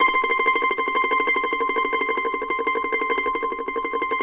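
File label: mandolin tremolo C6; Sampleheads; Whole Lotta Country 1 & 2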